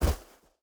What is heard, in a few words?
footstep burlap sand jump land; Rust